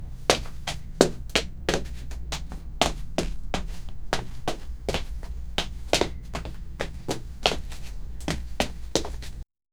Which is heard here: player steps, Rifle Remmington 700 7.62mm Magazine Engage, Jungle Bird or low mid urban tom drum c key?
player steps